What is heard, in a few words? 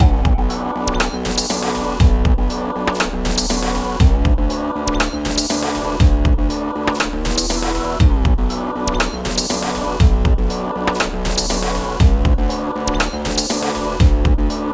Break Out Loop